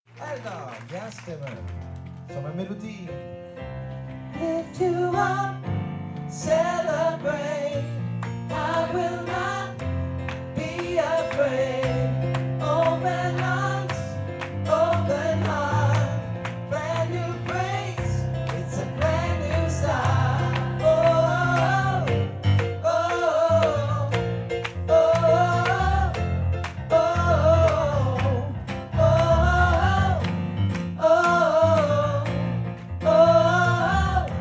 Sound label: brand new start alt